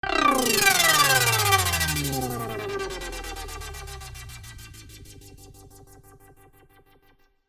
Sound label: Feedback